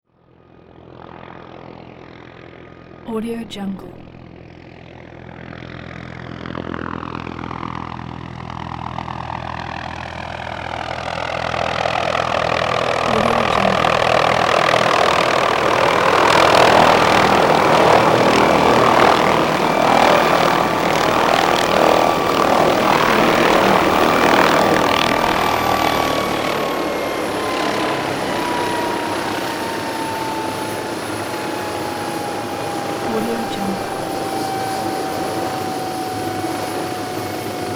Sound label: notar helicopter slow in land long idle; prosoundfx